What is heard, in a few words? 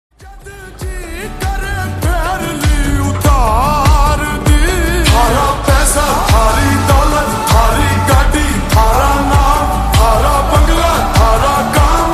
Slowed Reverb Ringtone